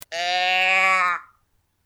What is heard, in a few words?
Teddy Bear Growler sound; Medium Millboard